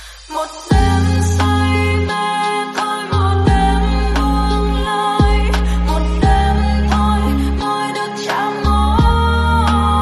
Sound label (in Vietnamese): Nhac Chuong Lofi